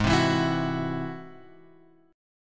G sharp Augmented 7th G sharp; G#+7 Chord {4 3 2 X 5 2} strummed

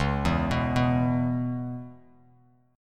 C sharp Minor 7th, Bass Standard; C#m7; arpeggiated; JGuitar

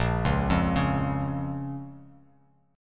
A sharp Minor A sharp down Bass Standard; arpeggiated; JGuitar

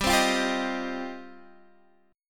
G 7th Suspended 4th Sharp 5th, Guitar DADGAD

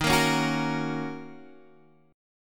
Eb Minor 7th Eb Guitar Standard; strummed

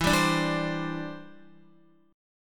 E Minor 7th Sharp 5th, down, Guitar; strummed

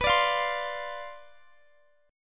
C Major 7th Suspended 2nd Suspended 4th B, chord

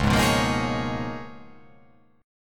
C sharp 13th C sharp

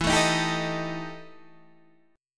E Minor Major 7th Double Flat 5th E, guitar

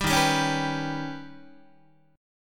Eb Augmented 7th Eb down Guitar; Eb+7 strummed; JGuitar

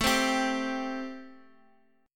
A-Minor 7th A

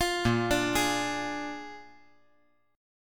A sharp 7th A sharp

